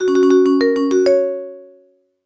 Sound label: complete; pillPopper